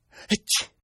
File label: Sneeze; Sneezing; Human; Partners In Rhyme